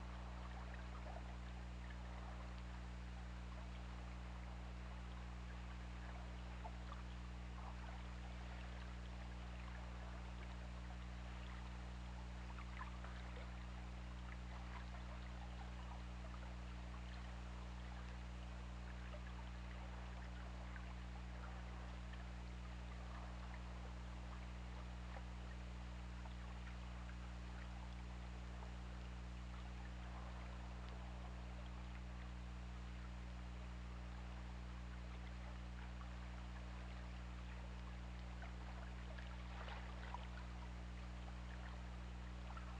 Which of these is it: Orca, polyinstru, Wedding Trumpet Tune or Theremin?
Orca